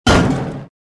metal bounce

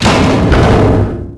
Metal door fall; Max Payne; subway

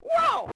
whoa; Crash Bandicoot The Wrath Of Cortex; XBOX

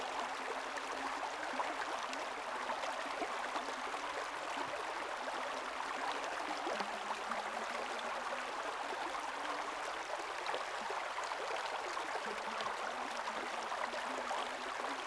medium stream ambience; Star Wars Obi Wan; XBOX

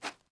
fs sand, footsteps

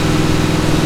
f150 rpm, Engine; Cars; XBOX

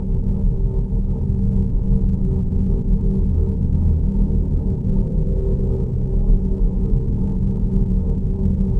quiet room, ambience; prototype

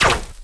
rico repeater Cannon; impacts explos

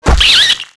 Rat death; characters

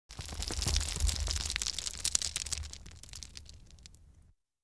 Quick Tumbling Rock; Environment

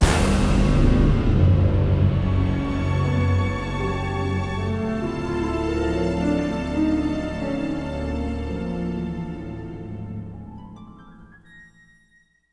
music flourish

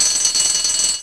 phone ring pay phone

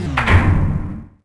door hydraulic metal reverb; doors elevators; Star Wars Obi-Wan; XBOX

